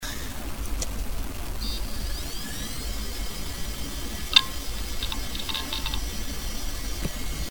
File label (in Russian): Zvuki izdavaemye polnostyu ispravnym vinchesterom Seagete U series